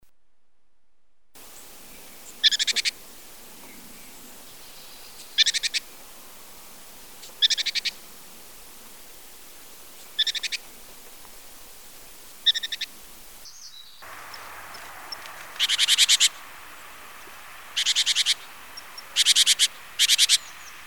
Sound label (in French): Mesange charbonniere, chant